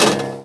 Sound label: gear, Car